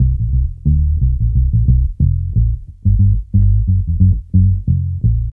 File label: Bass Loop, Ambient Grooves